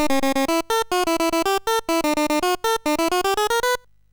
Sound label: sid MOS6581R2; GAMES; Munch Mania